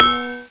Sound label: GONG, CMI